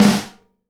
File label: SNARE RUFF, Live Snares